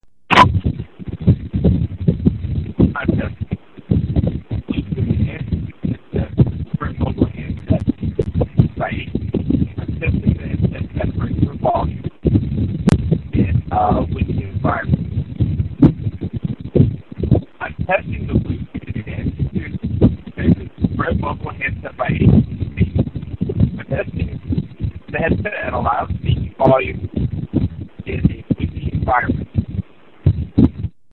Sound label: Wind; BlueSpoonAX2